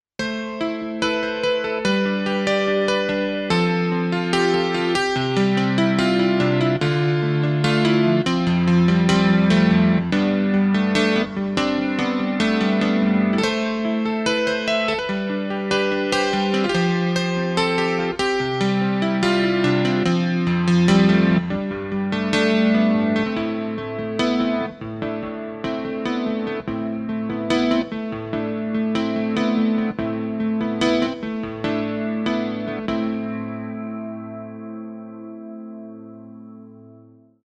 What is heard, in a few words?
Arturia V Collection X CP70 Keyscape